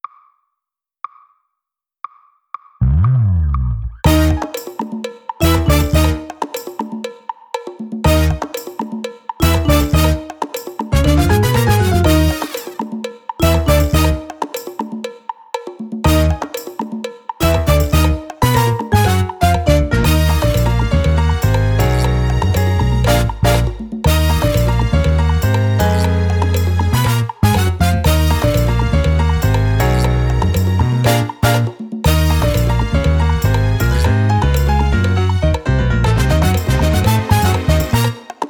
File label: Latin Land drumless